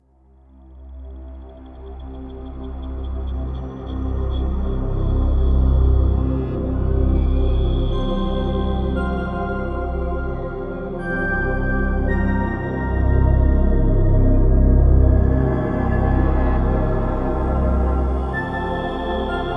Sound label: Surface Ambience; Surface 1; Metroid Samus Returns